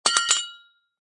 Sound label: Cartoon Bell Hit, metallic clonks; Comedy/Cartoon; Funny Sounds